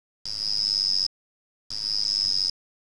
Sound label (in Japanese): madarasuzu, insects